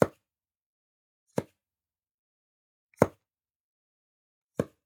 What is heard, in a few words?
Generic Button Plastic Small; boom library; The Interface